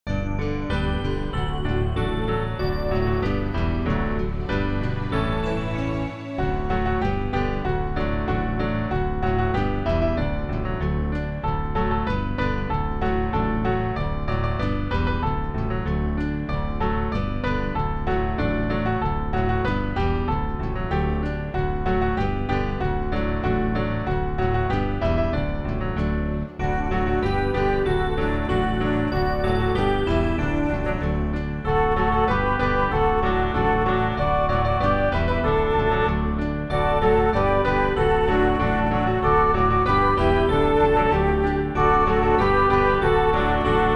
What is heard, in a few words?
instrumental; New Hope Music